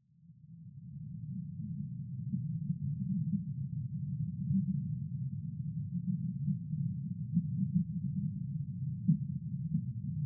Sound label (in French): Contact Mic Tac Tac Tram; Petite Ceinture